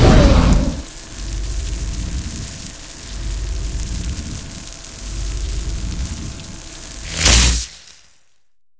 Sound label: Bolt